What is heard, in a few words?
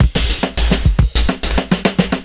FILLS, 70_s Breakbeats